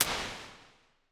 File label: Hybrid Plates Modern Snare Plate, Impulse Responses; Ableton Live 12 Lite